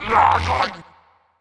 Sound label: orc vox die